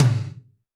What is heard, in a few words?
TD-20 Tom A, Roland TD-20 Toms